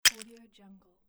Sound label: small ice drop